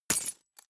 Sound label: key ring large drop carpet medium; objekte; schluessel